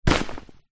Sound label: cool bag fabric grab with hand